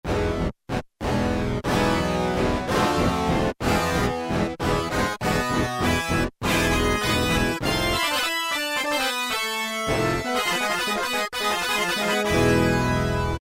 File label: HEAVY METAL, Casio VZ-10M; Internal factory combi